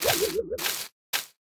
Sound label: Leave Shake Full